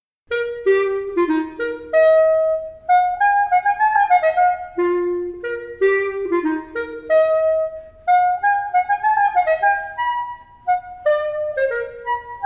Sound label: metal clarinet